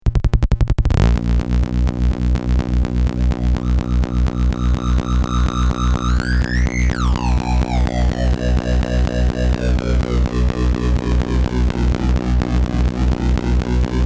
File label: EMS VCS3 Putney, synth; EMS VCS3 The Putney